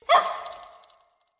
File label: alert